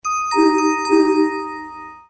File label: new email, phone tones; Samsung SGH-i607 BlackJack